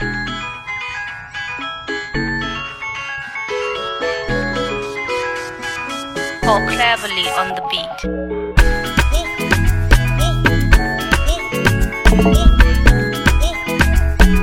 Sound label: Amapiano Ringing; Paul Cleverlee